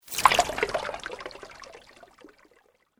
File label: Sweep Organic Medium; Created sweeps, swooshes and other movement effects; Sweeper Sound Effects; Sweepers (Sound Effects:Sound Design)